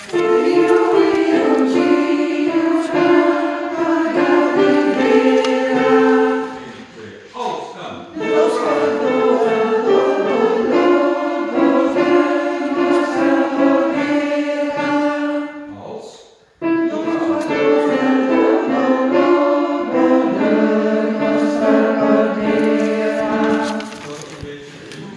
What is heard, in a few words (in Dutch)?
Riu Riu Chiu Alt Sopraan